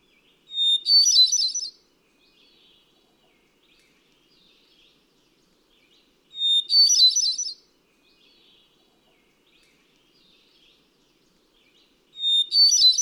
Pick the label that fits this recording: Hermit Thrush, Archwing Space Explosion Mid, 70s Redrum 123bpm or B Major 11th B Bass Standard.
Hermit Thrush